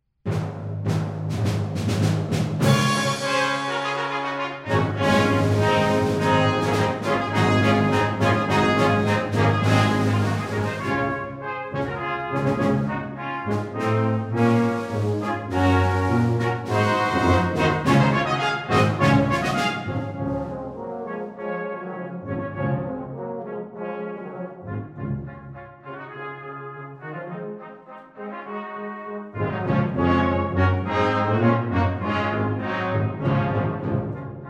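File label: A Christmas fanfare, Brass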